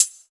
old school soft sounding hihat a sharp key, closed hi hat sample